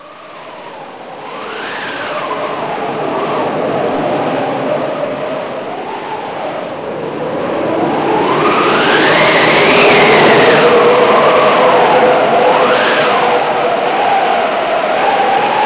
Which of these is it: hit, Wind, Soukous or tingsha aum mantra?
Wind